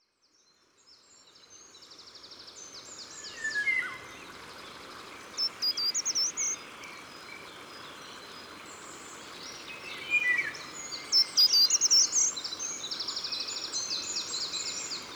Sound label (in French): Troglodyte mignon et loriot d'Europe, Concerts Naturels de la Drome; Studio les Trois Becs